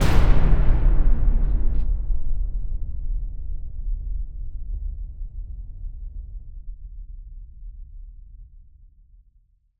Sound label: BOOM Endless Doom, Cinematic Metal Impacts